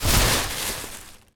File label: objects, World of Tanks